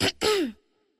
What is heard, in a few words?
gambler idle; Hot Party Deluxe; Wazdan